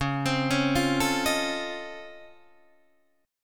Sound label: C sharp Augmented Major 9th, C sharp, down, Guitar, Open D